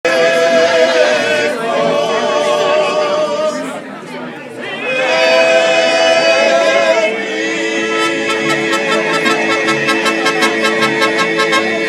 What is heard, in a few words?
mariachi, reception; Ambassador